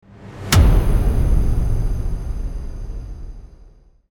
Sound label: Gritty Hit Epic; Ninja Tracks